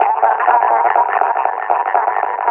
panting, combine officer; Half Life Alyx

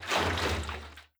contents full, metal barrel; physics; props

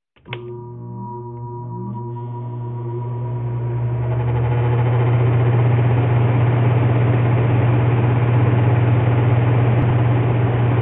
air conditioner cycle; ambient machines; Counter Strike Model Pack; CS:S

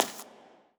fs tile, footsteps; player; Portal 2

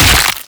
host abandon crack, headcrab reviver, creatures

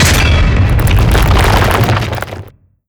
burst creak, smokestack; destruction; Half Life Alyx

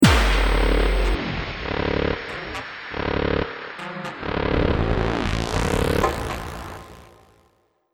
lost round, music

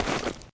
sand, footsteps

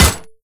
impact, metal bicycle; Half Life Alyx; props

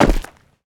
footsteps land dirt; player

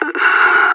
death; Counter Strike; CS:GO; separatist

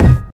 physics cube lg; Portal 2

